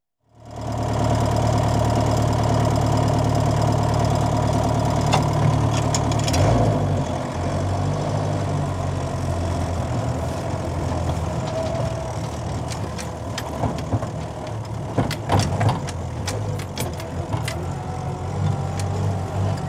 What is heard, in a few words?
Landrover Safari Diesel Drive; Farm Machinery; BBC Sound Effects Library